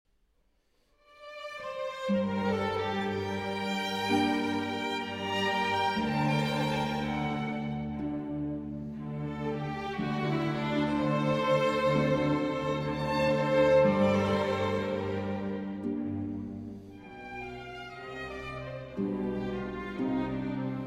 Concerto For Harp and Orchestra in G Major II. Andante